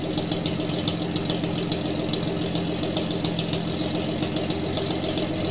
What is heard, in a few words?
0900 RPM, engine; AssaultCube; Alien Stars; American Muscle Series; SkyEngine